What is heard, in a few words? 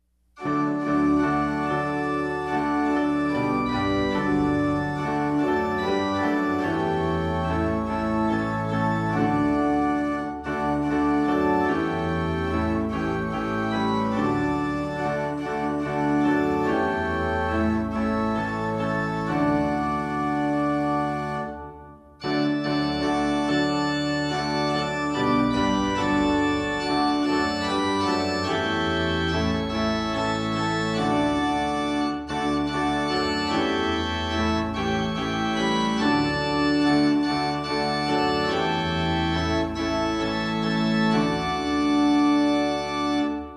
SDA HYMNAL SONGS TUNE